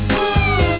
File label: saw light; beeps